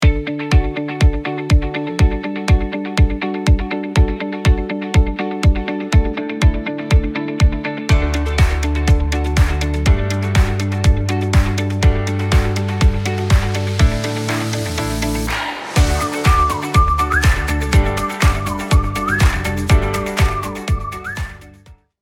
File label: music